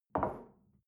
shell place on table soft short; berufe; gastronomie; soundpool

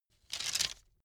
bamboo sticks; objekte; holz

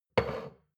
shot glass place table slide medium, gastronomie; berufe; soundpool

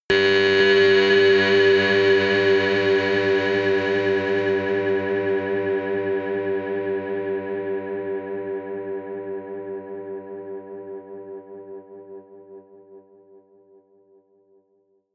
stinger hum, drones; musikelemente

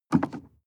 sports car int pedal gas step and release hard; autos; verkehr transport

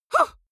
female fight huh short; comedy-cartoon; soundpool

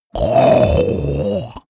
monster choking zombie; koerpergeraeusche; comedy-cartoon; soundpool

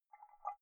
dabble glas bowl soft slow; industrie wirtschaft; gastronomie

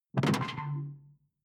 sci-fi alien console hard; maschinen; signale; soundpool

